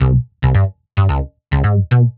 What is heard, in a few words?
bass, Loops Phonky